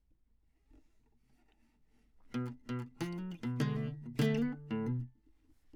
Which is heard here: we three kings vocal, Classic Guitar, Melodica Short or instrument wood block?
Classic Guitar